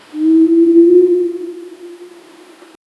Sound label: whispering wind through, well